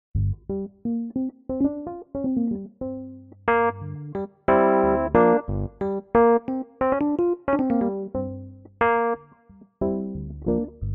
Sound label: Vintage EP Hard